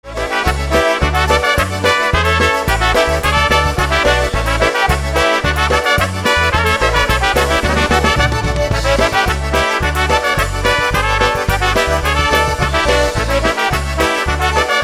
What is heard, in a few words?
Honky Style Polka